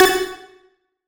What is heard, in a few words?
Retro Blip; Alarms Blip Beeps; GameSFX